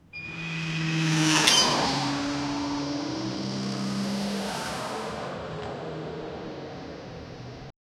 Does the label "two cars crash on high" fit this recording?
Yes